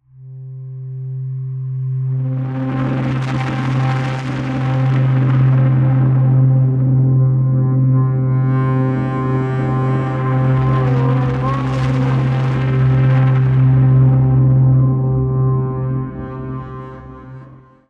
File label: Warm Waves Crashing; Void & Vista; Kontakt